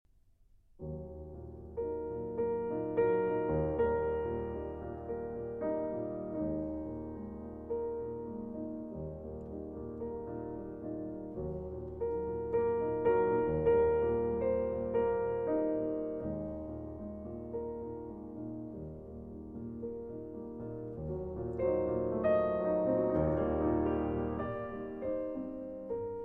Cello Sonata in G Minor, Andante; Op. 19

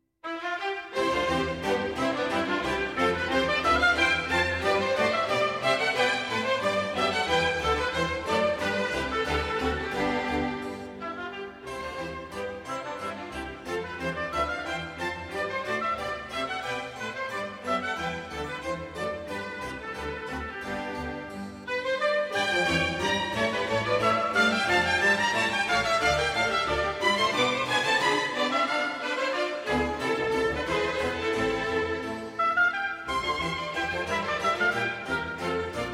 orchestral suite, bourrée; No. 1 in C major, BWV 1066

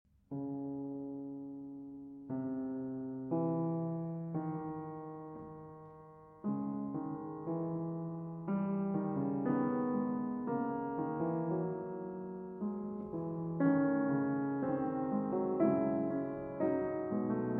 Fugue in C-Sharp Minor; Prelude & Fugue in C-Sharp Minor, BWV 849

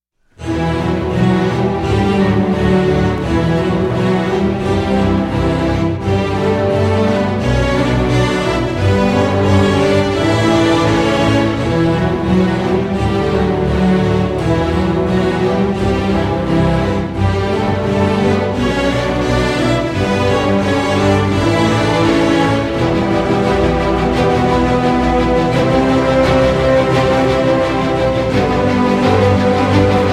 Orchestral

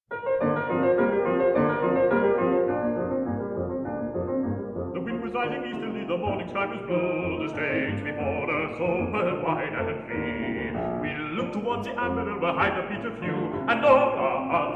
Male Voice Choir; Climax Male Voice Choir; Songs of the Sea, Op.91

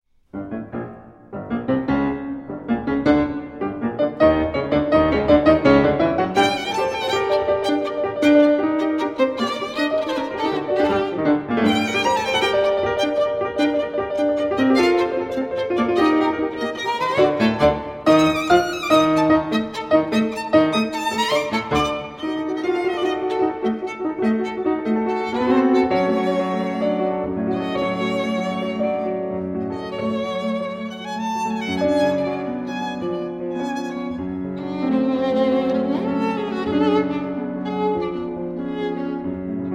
Violin and Piano Appassionato; Suk 4 Pieces For Violin and Piano

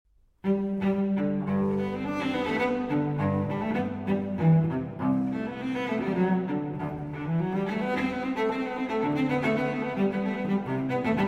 Cello Suite No. 1 in G Major; BWV 1007; J.S. Bach

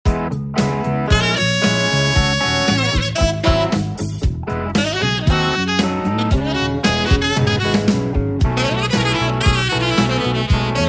Rock Funk

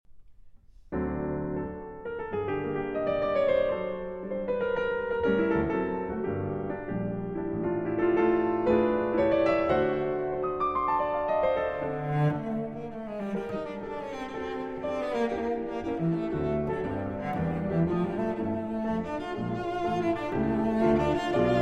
Cello Sonata in G Minor IV. Finale. Allegro

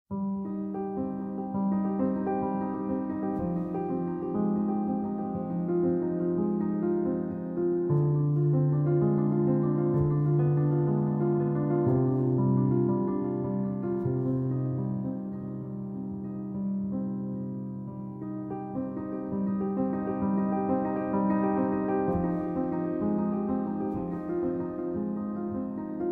Solo Piano

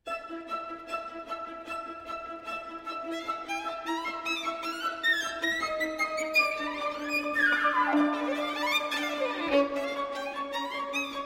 Violin Concerto in D Major, Scherzo, Vivacissimo

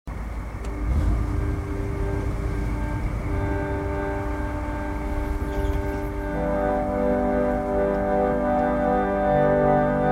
Organ Solo

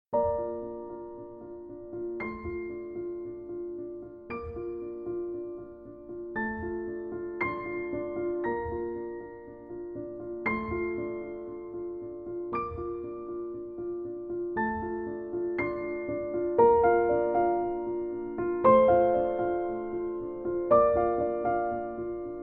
Piano Version

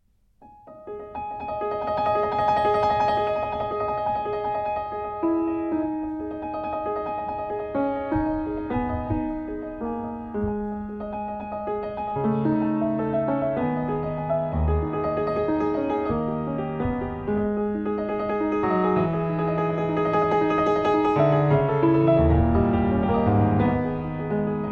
Preludes in G-Sharp Minor

